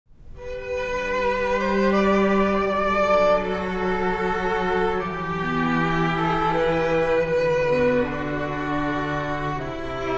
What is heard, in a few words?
Quartet; quartet con spirito